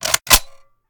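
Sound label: sniper raise first charge; gmod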